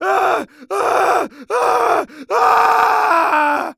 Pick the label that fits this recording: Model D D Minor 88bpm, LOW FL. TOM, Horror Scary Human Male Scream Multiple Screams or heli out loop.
Horror Scary Human Male Scream Multiple Screams